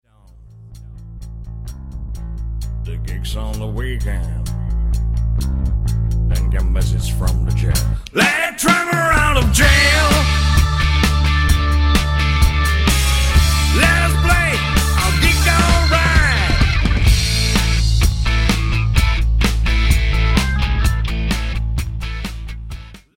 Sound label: Drummer